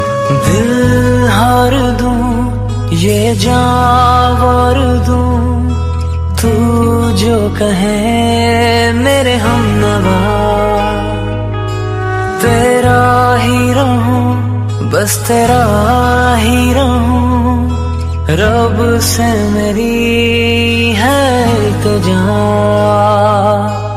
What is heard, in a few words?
most romantic hindi song ringtone; bollywood ringtones